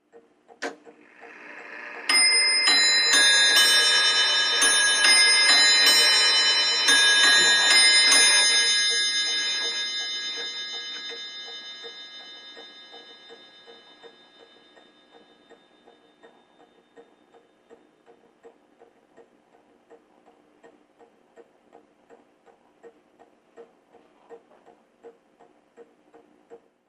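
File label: Old Mantle Clock Strike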